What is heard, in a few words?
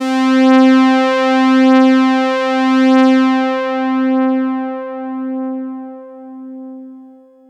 LEAD Benny (C), Melodic One Shots; Cymatics; ANALOG EVOLUTION Beta